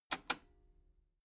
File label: metal button cassette stop release